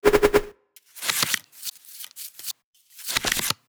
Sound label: Animation Dealing Cards